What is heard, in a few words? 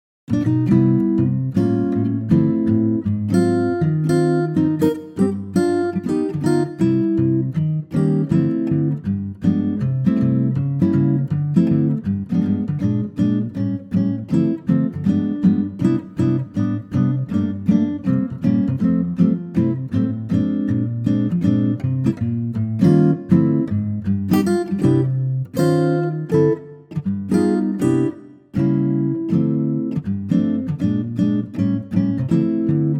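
C acoustic guitar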